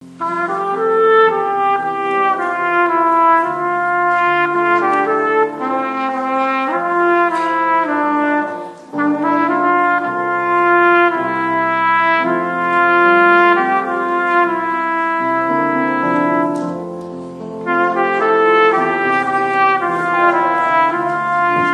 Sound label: Trumpet